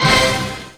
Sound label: ORCH HIT; Kawai r-50; Drums